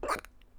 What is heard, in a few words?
bones snapping; meat madness redux; godot; tentacle Sfx